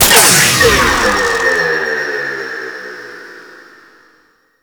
Collar Deactivate; Warframe; Alad V; Abilities

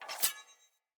Sword Accent Blade; Weapons; Player; Swords

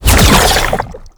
Treasure Box Proj Throw; Warframe; ManInTheWall

